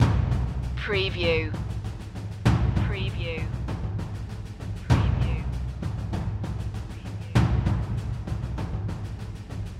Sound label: climbing to the top loop